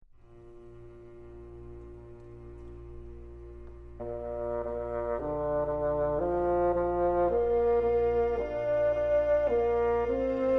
Bassoon Concerto in B flat major - Andante moderato; VIVALDI, Antonio; The Four Seasons